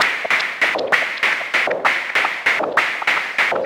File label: Modular 130BPM